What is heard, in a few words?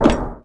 sheetmetal walk